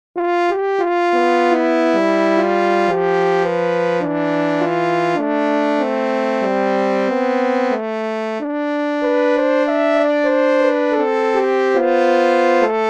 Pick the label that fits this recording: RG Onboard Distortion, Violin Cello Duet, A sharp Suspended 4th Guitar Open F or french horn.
french horn